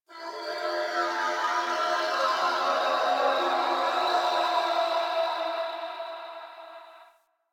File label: Chimera Portal Cry One Off; Ambience; Warframe; DE